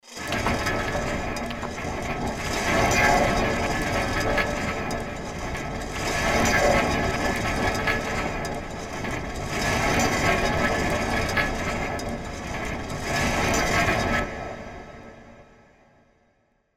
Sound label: bowl